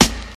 raw snare sound a key, acoustic snare sample tuned to the A note